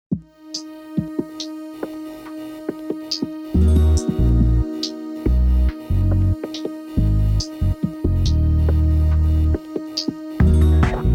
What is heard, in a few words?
Transition, Pop Funk